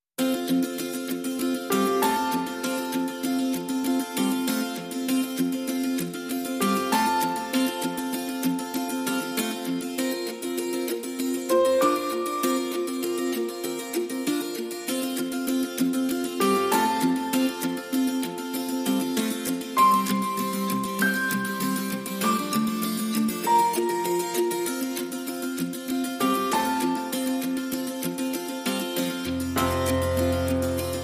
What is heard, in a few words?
No Drums No Bass Mix